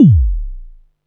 kick

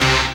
dance chord

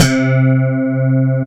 High Slap, baesse

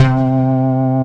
High tuned pulse Bass

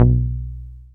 Dirty, baesse

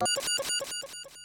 Beep Ride

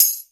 perc, Single Drums; percussion

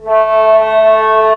Indian Pipe; instrumente

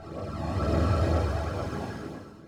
Orion passing

